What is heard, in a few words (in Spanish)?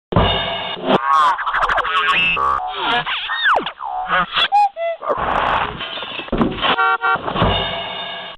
Efecto de sonido Payaso